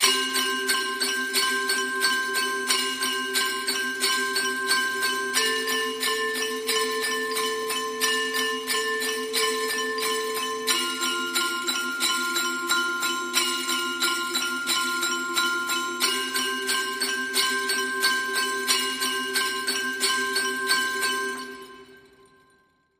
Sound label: Morning Bells